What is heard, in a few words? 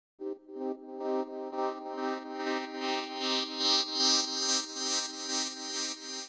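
synth, Hardcore Breakbeat, C minor; Big Fish Audio; Hardcore Breakbeat Action; Decimater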